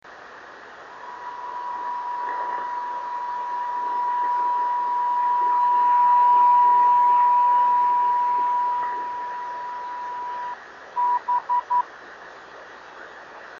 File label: 28045KHz